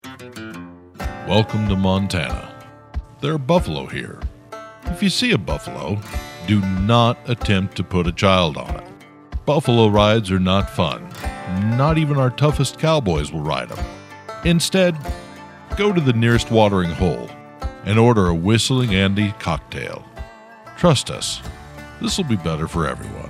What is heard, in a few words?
Buffalo Whistling